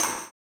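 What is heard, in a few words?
tambourine, 160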